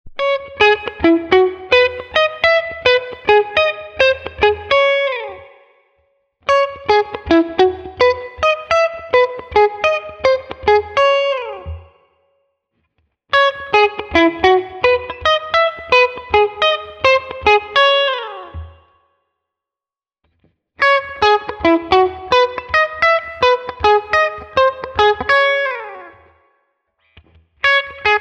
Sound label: Clean Melody All Positions